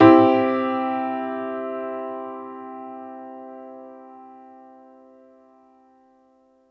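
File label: Piano Upright major med; TrashLibrary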